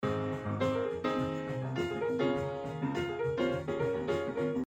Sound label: Piano